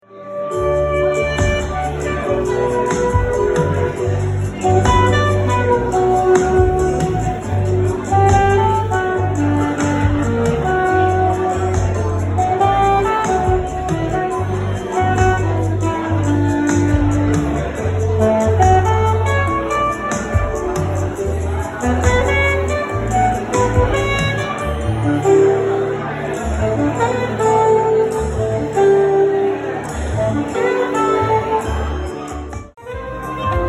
Solo Medley

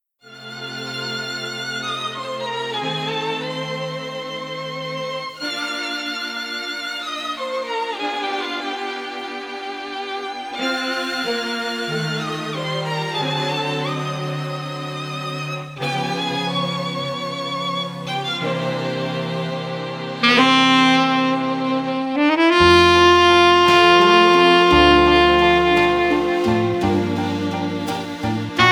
SAX

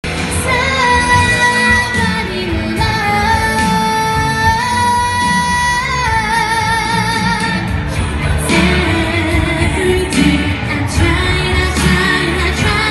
Vocal Entertainer

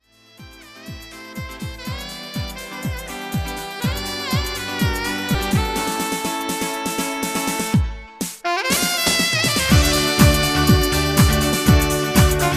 Sax

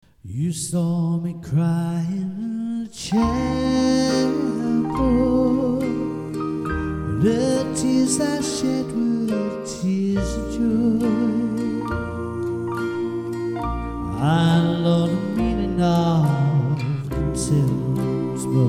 vocal

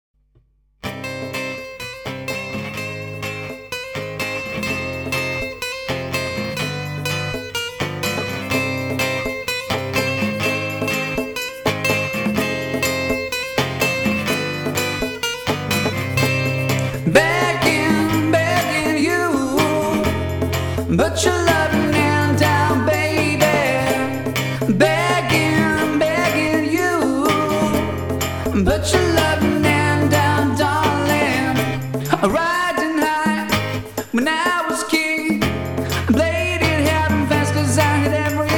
Acoustic

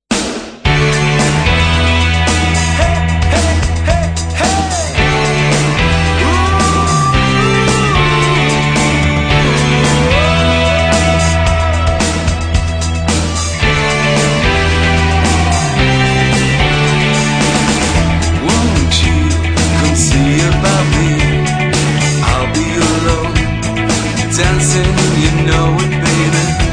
80s tribute

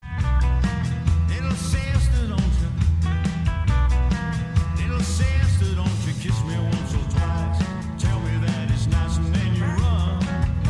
backbeat

